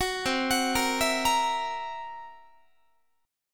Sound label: C 7th Flat 5th C down Guitar; C7b5 arpeggiated